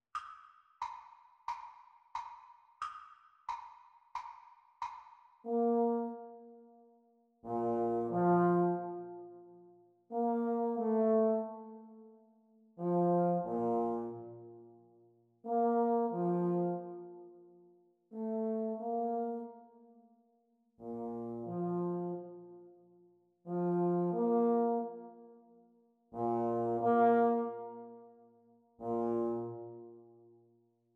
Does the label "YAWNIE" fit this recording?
No